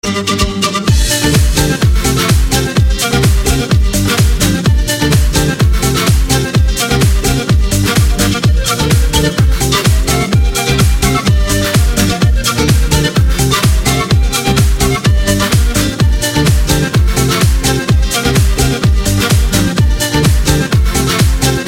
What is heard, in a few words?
Clubmix